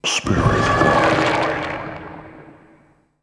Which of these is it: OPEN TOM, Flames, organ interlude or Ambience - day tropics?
Flames